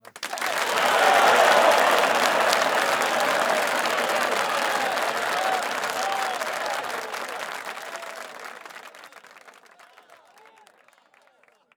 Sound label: Big Cheer ALL